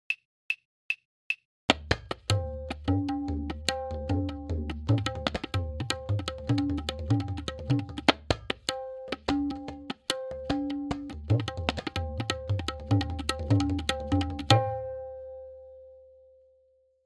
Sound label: Gat; Doopalli Gat